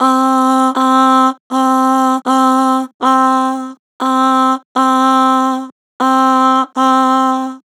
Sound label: Vibrato "Aah", Vocals; Harmor Vocals